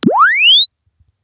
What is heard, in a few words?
Press Two Sides Chirp; Samsung 4 Recordings